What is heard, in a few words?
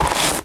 Sand footstep